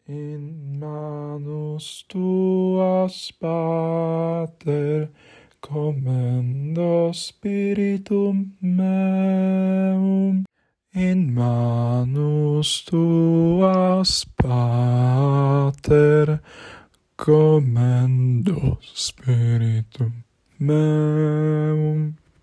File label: Bass